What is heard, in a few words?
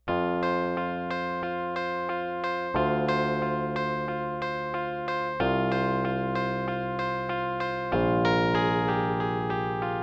SYNTH SOUNDS